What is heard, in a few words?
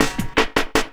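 LOOP snare drum, Drum & Bass, 160 BPM; Ueberschall; Drum & Bass Resonance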